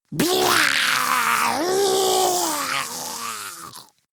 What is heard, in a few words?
Arm Pain Grineer Ghoul; Grineer Ghoul Expired; Battle Barks